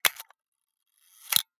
old camera slow shutter

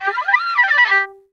harmo scrape; Harmonic Scrape: A bowed violin sweep through the string harmonics - it makes a good spot effect in dance music; filter sweep (kind of!)

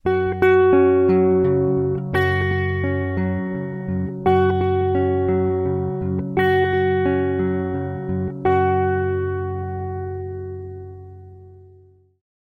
guitar picking 6/8 85bpm

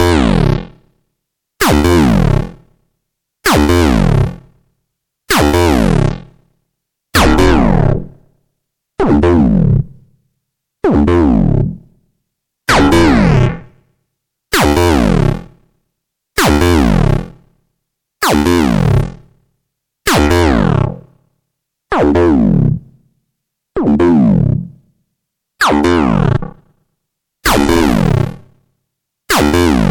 lowfi bender 130bpm

